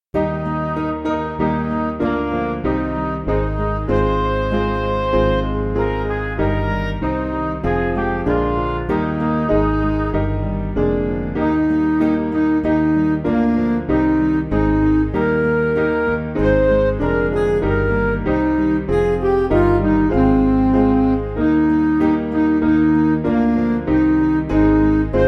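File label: Music Backing Files for Churches; Holy Father God; Catherine Connolly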